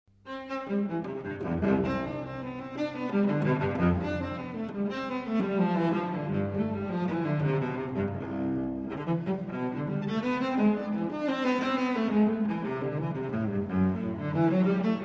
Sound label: Unaccompanied Cello Suite C Major Courante; BWV 1009